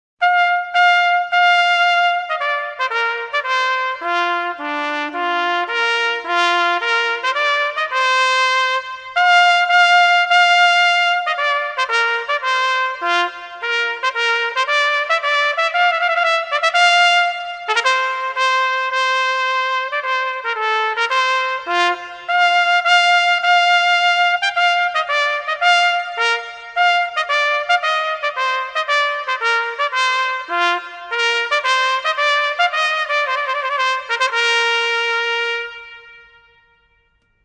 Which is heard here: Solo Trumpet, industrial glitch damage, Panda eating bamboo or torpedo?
Solo Trumpet